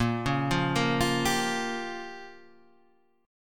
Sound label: C sharp 6th Flat 5th A sharp